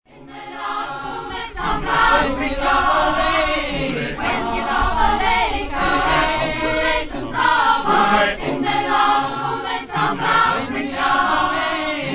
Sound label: shosholoza; safari